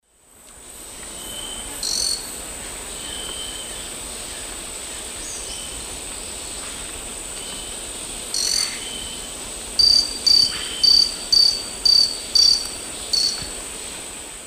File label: Myiornis ecaudatus, calls or song; S. America; Eastern Lowlands